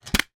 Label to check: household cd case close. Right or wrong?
Right